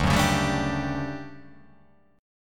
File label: D Major 11th D down